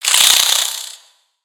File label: Spider Rage; creatures mechanical; Lego